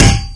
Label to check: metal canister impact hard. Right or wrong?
Right